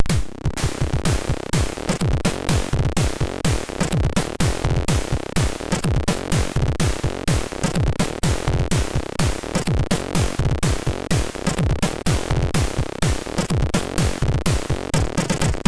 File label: Joke 2, MOS6581; MOS6581R3